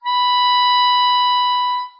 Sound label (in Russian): gubnaya garmonika dlinnaya tihaya nota